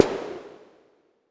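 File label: vermona drm1 mk3 snare drum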